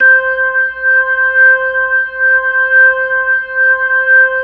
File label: C5, B3